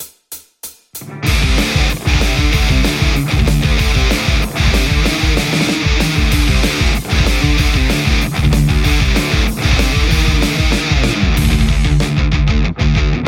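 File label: metal Telecaster; nickcrow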